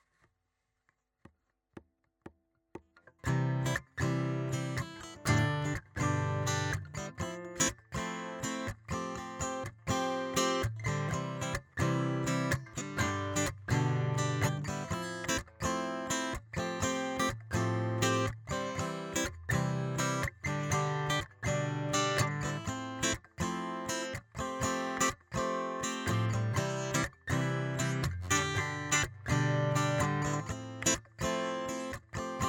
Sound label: C G FM7 FM9